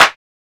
PRC DRUM, Roland; Northstar - Drumscapes Roland; Bonus Perc

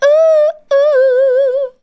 vox, Techno Trance; Ueberschall

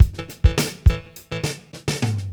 fx, Beat Loops, 103; Zero-G; Monster Beats

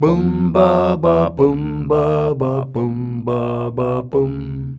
BUMBABA, MALES, Hyper Dance; Best Service

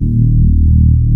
CMI ORGAN; Wizoo - Powered DX

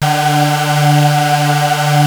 Prophet 5, D4; Hollywood Edge - Giorgio Moroder Rare Synthesizer Collection

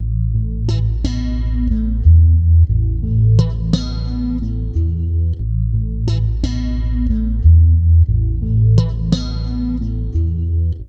Bass Guitar Loops

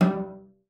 G3, CELLO FINGER; Xsample VOL-5

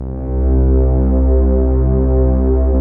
rich mellow, Rich Pad

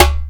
Yamstikk; Mo' Phatt; Digital Sound Factory Mo' Phatt

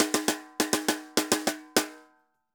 Tambor Poly; Best Service; Festa Latina VOL-1; Drums & Percussion

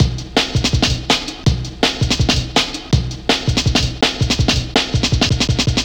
164 Insects, breaks; m8 breaks

